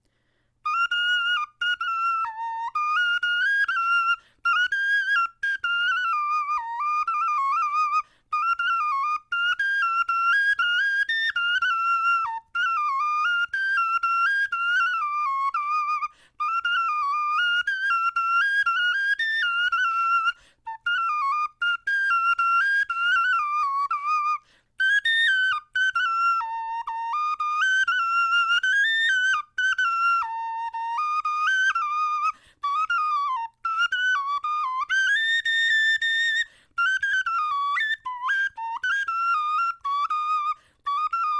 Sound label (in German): melodie